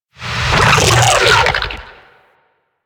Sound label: Sentient Vocal Attack; Warframe; Sentients; Dolicholyst